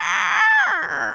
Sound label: imp idle